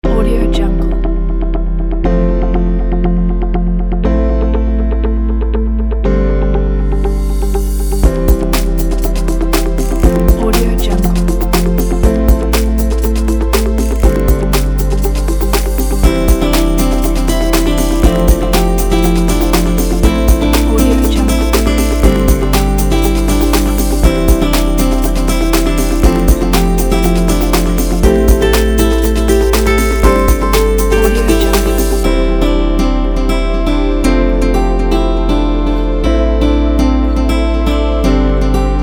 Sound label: inspiring slideshow